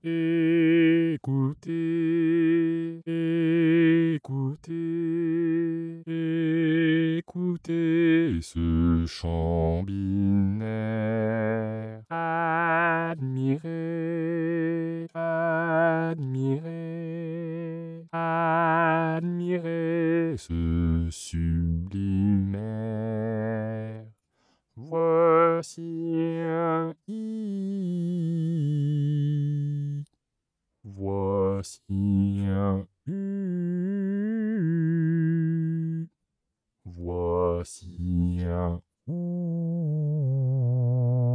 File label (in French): Baryton; IRCAM